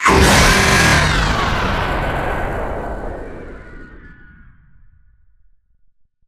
jumpscare; nightmare catnap; nightmare criters

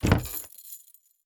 item crate pickup

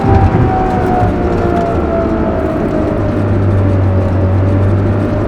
rev down, vehicles; tralalelo ferrari; sim fphys fer laferrari; salon

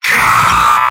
pain sharp; sniper; mvm

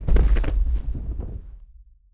step, mech; lin gang; adan mech

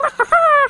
skelly small

